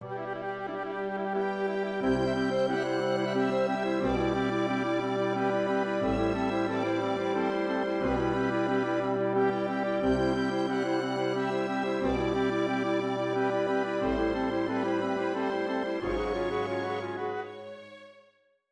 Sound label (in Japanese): 打楽器以外の楽器が、すべて奏されます。; オーケストレーション：中間－２・テュッティ